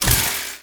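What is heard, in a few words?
shield drill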